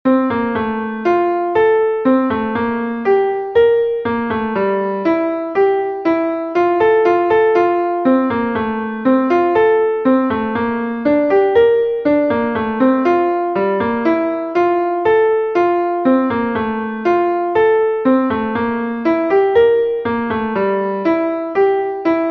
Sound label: Glockenspiel, classical piano; Magic Flute